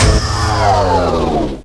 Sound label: tank off